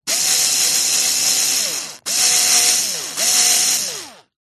industrial electric cordless screw driver or drill short bursts; zapsplat